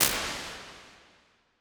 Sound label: Halls Brass Hall L; Impulse Responses; Ableton Live 12 Lite